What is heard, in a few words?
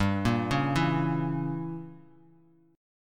G Diminished 7th, Bass Standard; arpeggiated; JGuitar